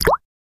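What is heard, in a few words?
Drip Single Falls Liquid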